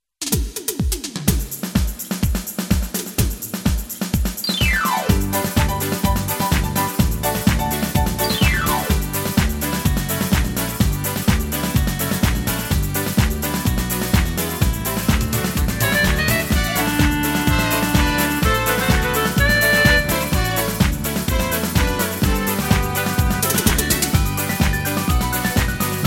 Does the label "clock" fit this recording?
No